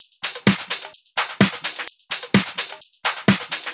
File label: Houseloops 128BPM; Vengeance Samples